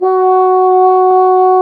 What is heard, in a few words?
BASSOO, Bassoon; Sound & Vision - Gigapack I CD 2 (Roland); Brass&Woods1